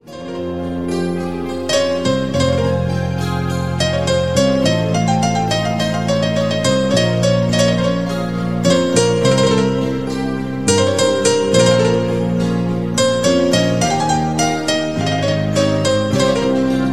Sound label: piano ringtone